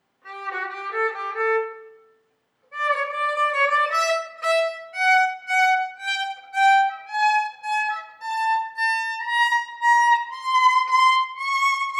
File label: Duet, Violin; Cheryl Violin Project